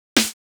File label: Snare